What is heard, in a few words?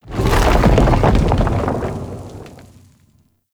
Rock Rumble; Props; Warframe; Tau; Ambience